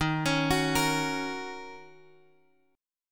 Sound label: Eb Minor 7th, down, Guitar, Drop D; arpeggiated